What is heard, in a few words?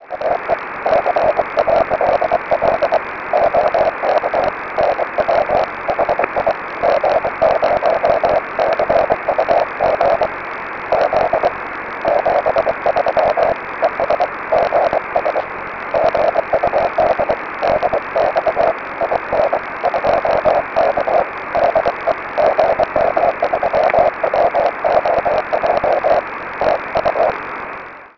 50MHz cw aurora